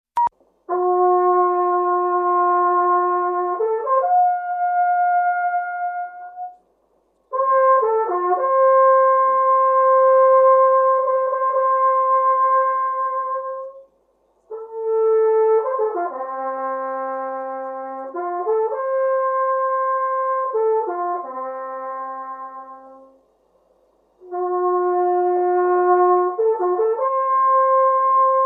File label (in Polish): HEJNAŁ MARIACKI